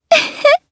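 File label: Laugh, Japanese; heroes; Shamilla; kingsraid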